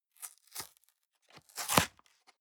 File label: box small tear apart hard; industrie wirtschaft; bueroarbeit